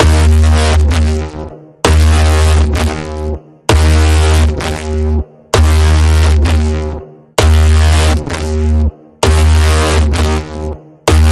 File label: Pulsing Code Generator, D sharp